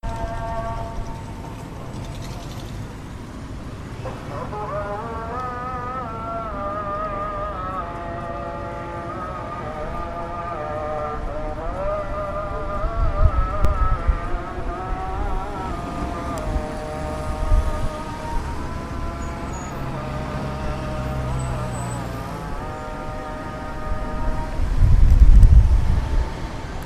bruits rues jerusalem

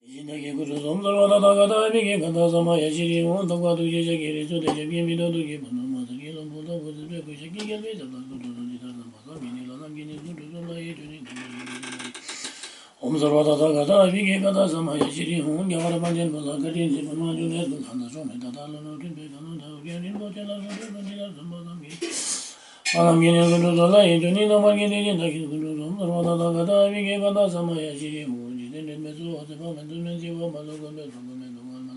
priere bouddhiste

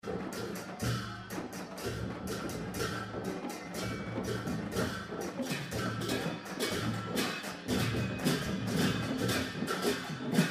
Bisket Jatra assortiment fin flutte; Bhaktapur

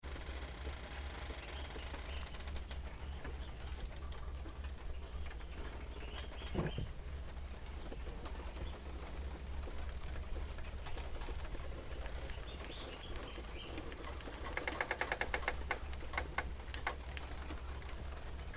bruits bambou

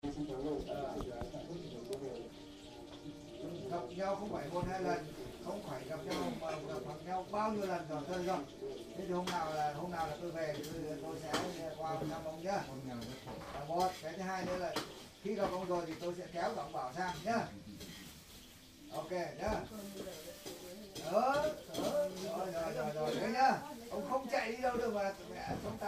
Ambiance gargotte lac babe

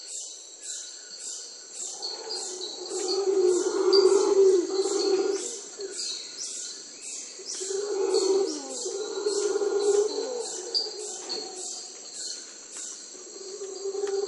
singe hurleur

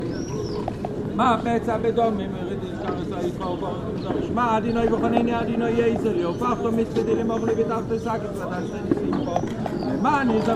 ambiance sonore mur des lamentations

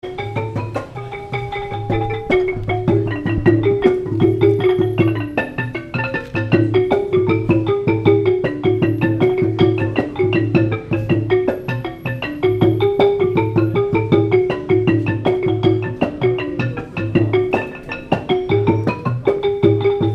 groupe musique devant palais royal

